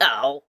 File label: pain; En surfer pain1; Fight Knight